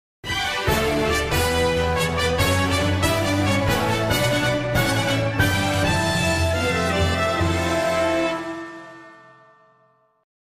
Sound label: Victory Fanfare; Earth Defense Force 5